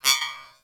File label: Beep Comby; Warframe; Corpus